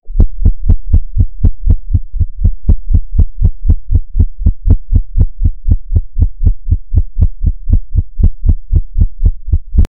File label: a heart beating slow then